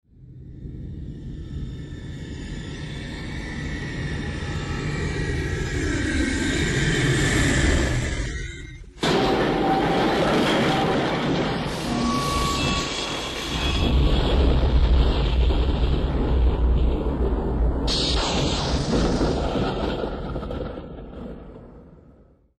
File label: Heavy Metal